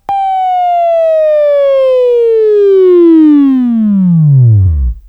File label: Buzz